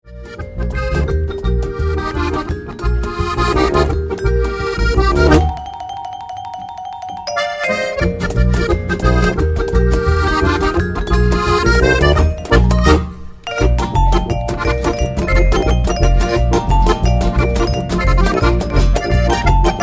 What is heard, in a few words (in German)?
Zwick Polka